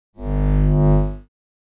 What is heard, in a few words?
Lightsaber sword turning on; energy